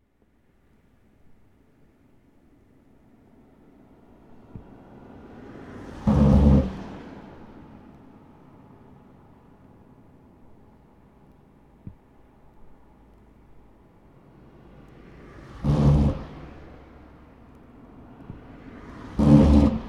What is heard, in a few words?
seydisfjordor; Ristarhlid